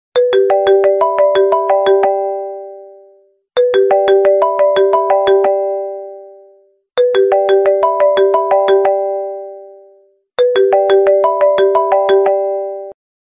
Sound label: i phone ringtone